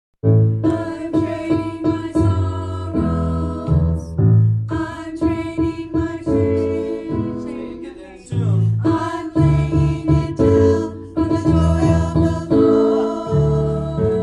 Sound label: alto, choir parts